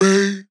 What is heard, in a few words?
Dry One Shot - G, Vocal; Tonal One Shots; Cymatics; Euphoria Vocal Sample Pack